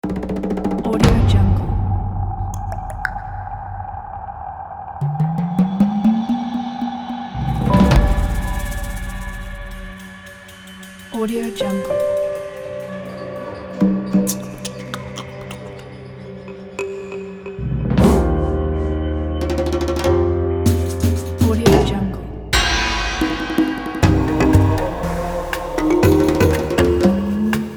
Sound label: african trailler; flaviocortizo